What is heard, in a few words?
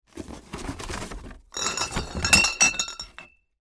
case beer